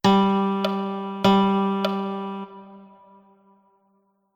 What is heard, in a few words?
Rhythm Notation Guide Half Note